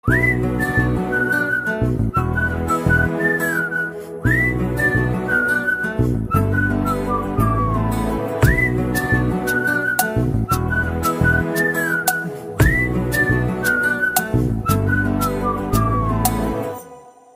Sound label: Bgm Ringtone